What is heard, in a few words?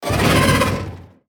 worm roar, creatures